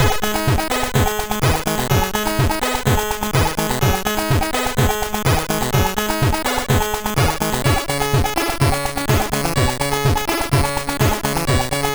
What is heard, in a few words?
Acid Power, MOS6581R3